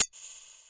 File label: tick